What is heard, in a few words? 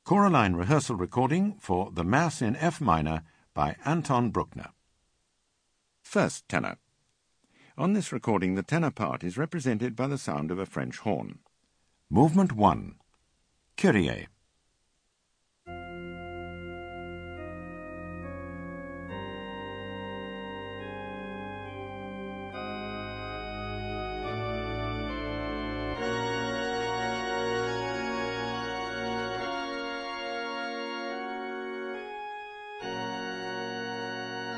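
Tenor; Bruckner Mass in F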